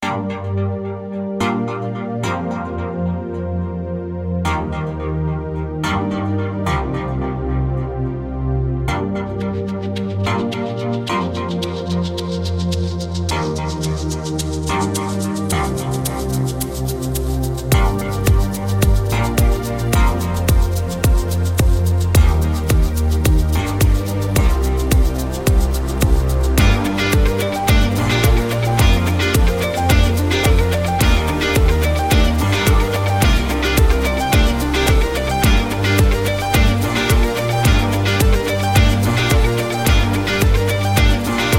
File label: Moog Madness